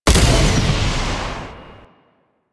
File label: Rifle Alt Fire Shot; Warframe; Weapons; Corpus; Crp Ruban Rifle